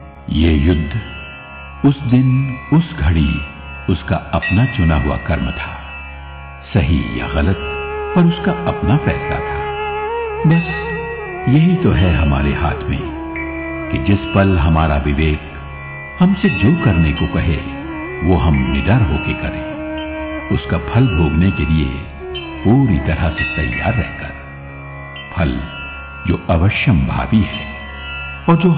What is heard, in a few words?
Gita Chant; Krishna Ji